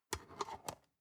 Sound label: storage lid slide close soft, box; objekte